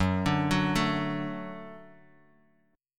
Gb Minor Sharp 5th, Guitar, DADGAD; 4,5,4,2,x,x; down; chord shape

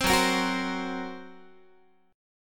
Gb Major Flat 5th, down, Guitar

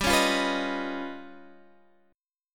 Db 9th Flat 5th G

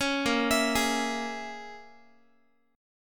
Bb Minor 7th Flat 5th, Guitar; Bbm7b5 arpeggiated

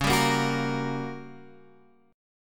G Minor Major 7th Flat 5th Db

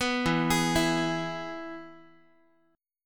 E-Major E, Guitar; arpeggiated; 14,12,11,14,x,x

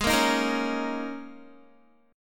Ab Suspended 2nd Suspended 4th Ab down Guitar; strummed; Absus2sus4

